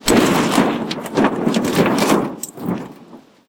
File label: crash, c172p